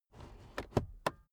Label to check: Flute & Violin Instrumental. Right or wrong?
Wrong